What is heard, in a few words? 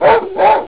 barky